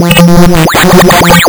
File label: lsdj waves; wavetables